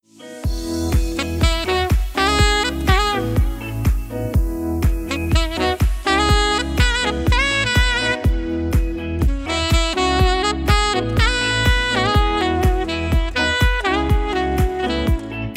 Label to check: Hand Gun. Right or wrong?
Wrong